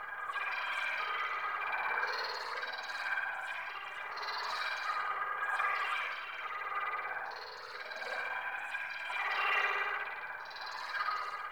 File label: Anima Aura Projectile Loop; Warframe; Warframes; Anima Animus